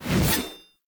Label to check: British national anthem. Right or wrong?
Wrong